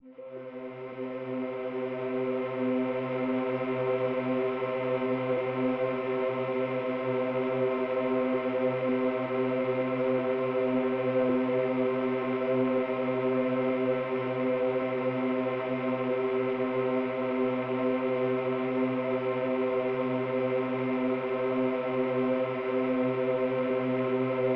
Loops mutantes

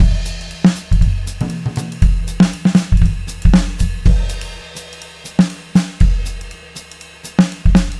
Loops mutantes

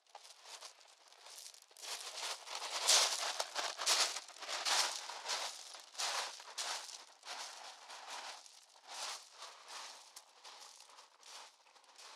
Pasos

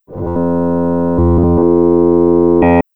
buque

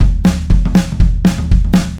Loops Mutantes

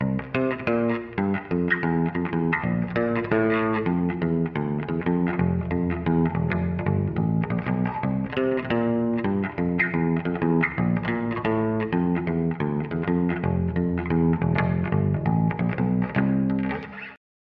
bajo coros

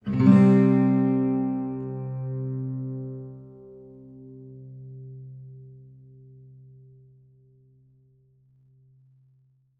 guitarra

